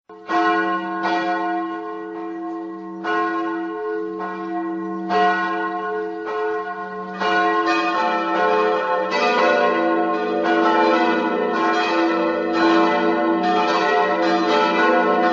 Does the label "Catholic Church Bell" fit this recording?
Yes